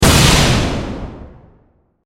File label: Force Field Hit, Science Fiction; 5.1; BlastWave